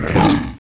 nemesis pain

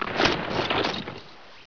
i draw, weapons

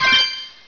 coin; warcraft3

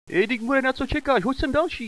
cough, hostage; cstrike

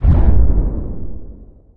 pull impact; alienboss

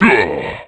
pain; zombie plague

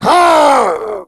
hulk pain

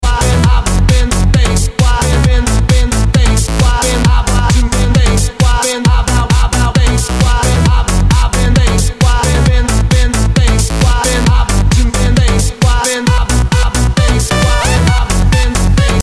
surf

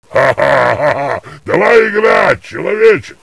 zombie burn

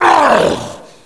Pain, zombie; cstrike; Nemesis

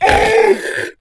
pain; zombie plague

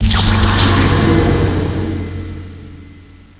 monsters death; oblivion darkangels

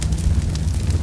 monsters burn; oblivion darkangels

